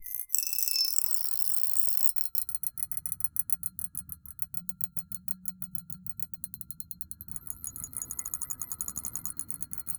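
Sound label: asmr of thin cutter gliding; grains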